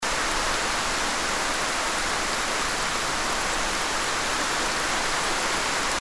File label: Heavy Rain